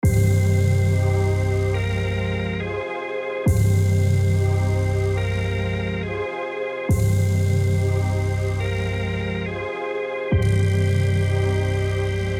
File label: Omni Organ